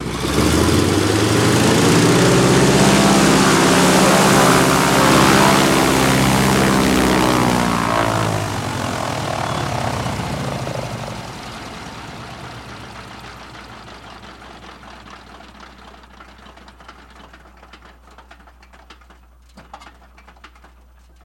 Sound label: DHC Rev Shut Off